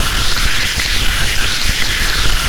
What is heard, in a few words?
fry, player; q3rally